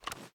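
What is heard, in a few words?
assault rifle reload empty fast tilt; viper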